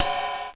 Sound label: Ride, EMU E-Drum